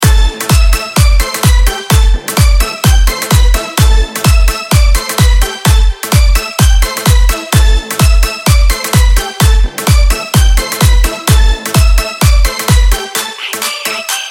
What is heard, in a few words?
Celebrate Time Music Ringtone